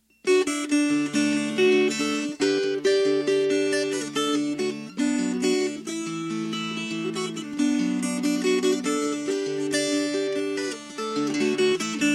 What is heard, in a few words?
Fingerpicked